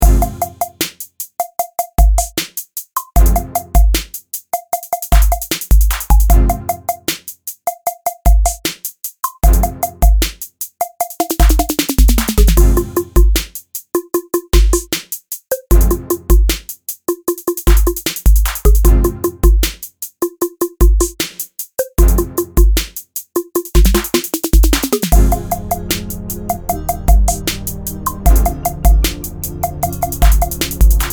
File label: groove